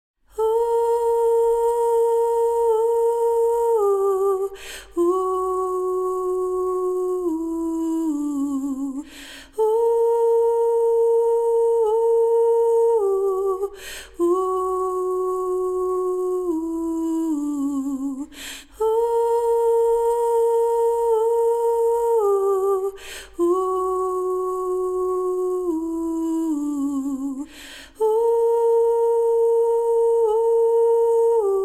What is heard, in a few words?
circle song soprano; feu de camp